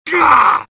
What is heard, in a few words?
sniper pain severe; svencoop addon; tfc; player